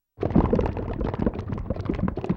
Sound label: Water Gurgle Mud Like, mudslide